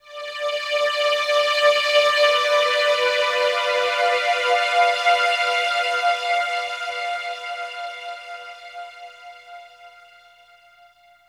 Pad String, Drum & Bass Pads; Sonic Foundry (Sony Creative Software); Knutrix Drum & Bass

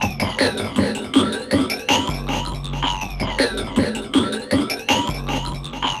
Noise Beat; Drums & Drones

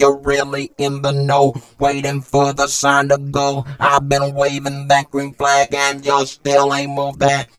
ROBOTRAP, funky vocals, rap ragga; USB Soundscan vol.18; AKAI

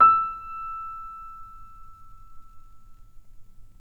PNO PP; Synclavier Sampler Library

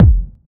Kick, Kickdrum; Equipped Music; Loungin House

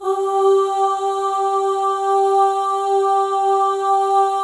OH, FEM, Symphony of Voices; Spectrasonics

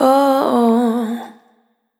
Spin, Single Voice Melodic Loops

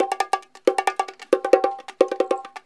CONGA, ACID JAZZ; Best Service; Advanced Media Trax Modern Composer

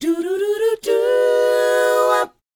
DOWOP C#; ILIO; Vocal Planet

